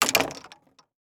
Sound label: Sports Club Golf Club Dropping Into Golf Bag Double